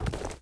body Get Up marble

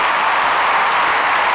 CROWD LOOP

